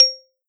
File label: marimba C5 DING